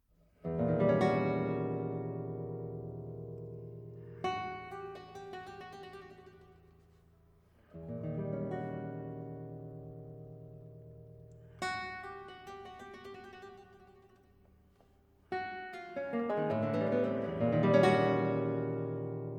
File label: guitar